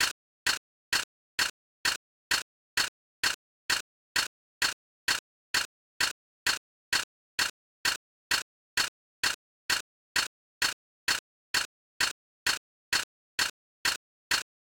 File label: cog; Robot 64